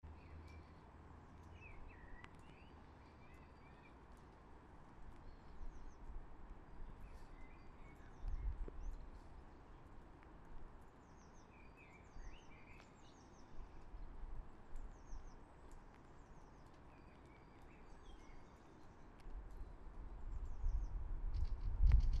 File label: helsinki kapyla park rain summer